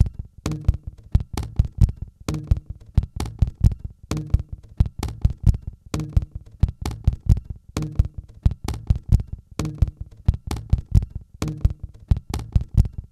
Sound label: Brisk Walk